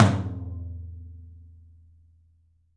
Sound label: open tom; 3D Sound; 3D Drums; 13 TOM